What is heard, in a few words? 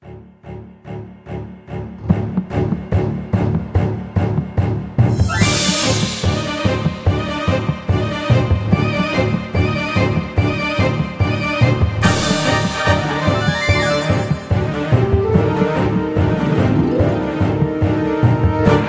Music; TR4; FMV